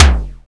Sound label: SDS5 KICK F2; Greytsounds VOL-1; SDX KICKS